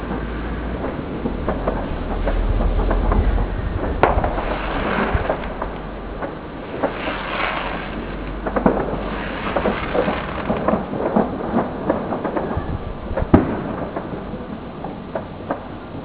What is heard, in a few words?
fireworks